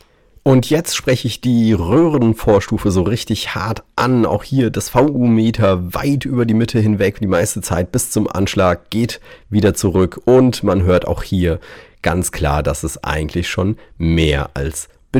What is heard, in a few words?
tube; Universal Audio 710